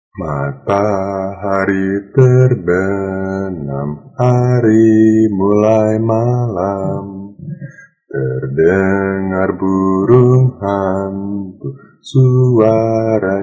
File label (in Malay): burung hantu